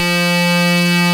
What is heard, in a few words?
RAVE BEEP; Best Service Alpha Dance vol.2